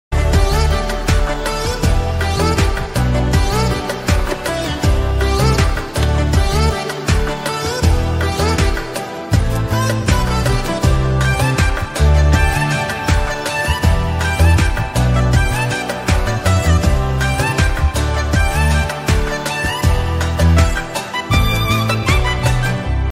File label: bgm song ringtone love ringtone